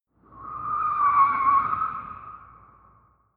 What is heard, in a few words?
car tire squeal distant